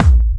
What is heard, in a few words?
Soft, kicks